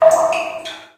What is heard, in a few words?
drop, underground; ambient